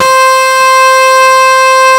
WAILING, DIRE LIKE; East West - Global Sounds

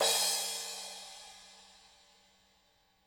crash, crashes

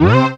synth, c, Rave Synths; Best Service ProSamples vol.37 - Dance Synths

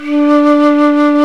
Flutes, Flt amb

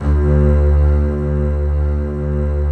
C BASS D2; SOLO C. BASS; Greytsounds VOL-1

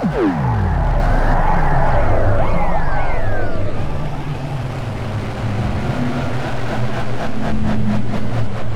loop, techno atmos

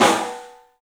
STEEL, Drums, ROOM SINGLE; 3D Sound

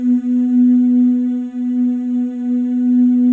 FEM, Symphony of Voices; Spectrasonics

